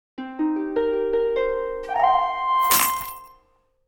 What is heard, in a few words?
Mobile game fantasy reward